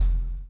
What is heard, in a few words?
CHAIN; Spectrasonics - Backbeat